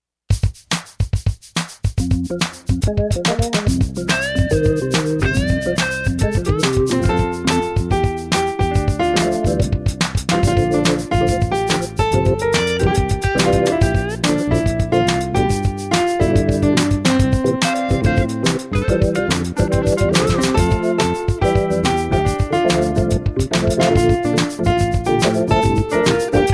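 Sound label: name that internal organ instrumental; badwolfpress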